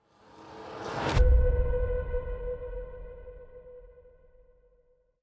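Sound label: Music Ambient Trans Pre race end; Music Amb 3#1 (Amb Trans Preraceend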